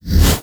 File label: ranged pre attack; terrorblade